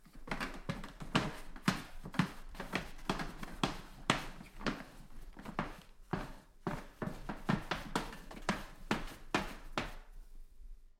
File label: rats loft noise; signs rats loft